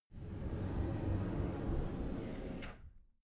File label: cupboard slide door open soft